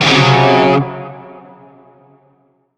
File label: Shawzin Hirajoshi Chord L; Music; Warframe; Narmer